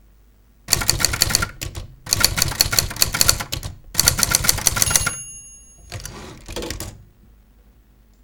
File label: typewriter